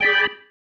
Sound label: Stab One Shots, Organ